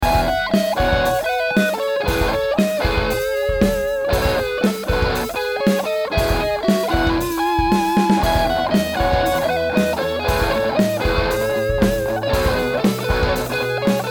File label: Dist 1x10 Combo